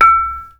KONGWONGLEK E6, Heart of Asia; Spectrasonics

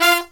HIGH HIT; USB Soundscan vol.29 - Killer Brass Riffs; AKAI; HIGHHITS

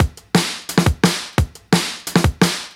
DNB BREAK; m8 breaks

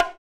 Roland Juno D Perc Menu Kit

